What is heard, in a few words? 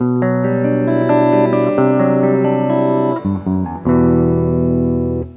Sound jazz bit